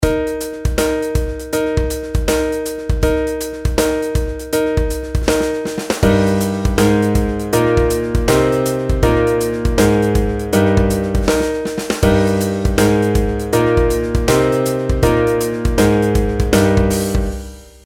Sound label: pitch patterns stepwise